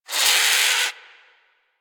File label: Tranq Dart Grunt; Warframe; Hunting Critters; Avichaea